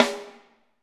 SD Real, Roland; Sound & Vision - Gigapack I

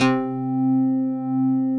OBDX GTR; Synclavier Sampler Library; KEYS AND GUITARS

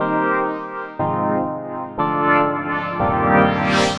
Marian String; Sonic Foundry (Sony Creative Software); The Electronic Music Manuscript Collection

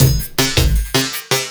LOOP, Drum & Bass, 170 BPM

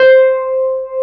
WURL C5; USB Soundscan vol.09; AKAI; Keyboards Old School

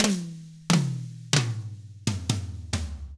SLOW ROCK, Drums; 66SLOWROCK

